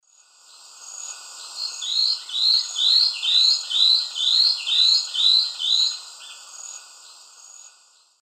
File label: Myrmotherula hauxwelli, song, S. America Eastern Lowlands